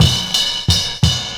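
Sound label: ride, perc loops, 175, drum and bass; EZRollers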